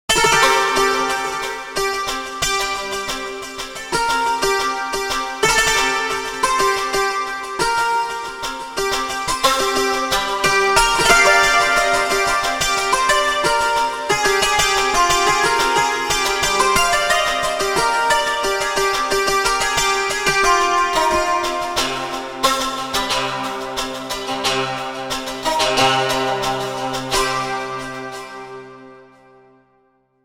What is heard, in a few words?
Indian Banjo; Omnisphere